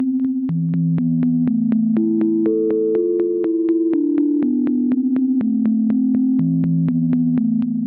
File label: 122 BPM Cmaj Amin; mmb studio soundtrack essentials; Tonic E